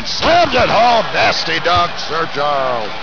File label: Rockets Empty Recycle Bin; 250 Desktop Themes